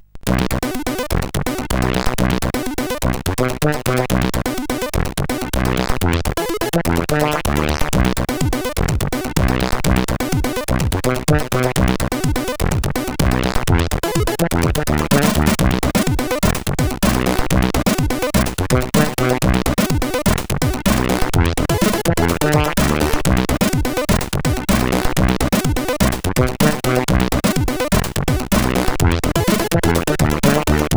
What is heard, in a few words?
Dead Funk, CSG8580R5